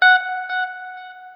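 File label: NOODLE SHORT, Guitar & Bass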